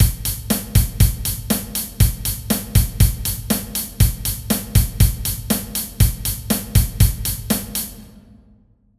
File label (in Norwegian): trommesett rytme